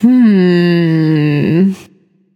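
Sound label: thinking, female; smite; voicepack; hera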